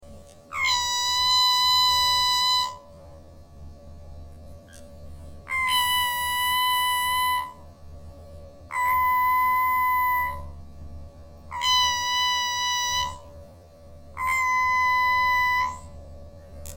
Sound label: Recording High Pitch; Audacity